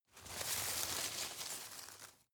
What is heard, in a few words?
drop medium